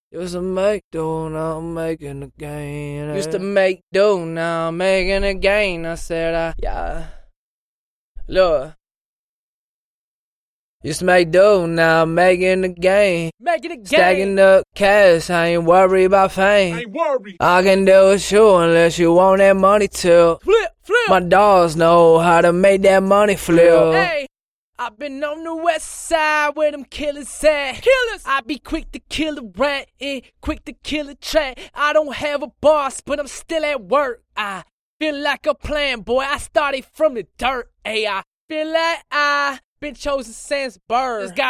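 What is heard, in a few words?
Conscious hip hop 138 A major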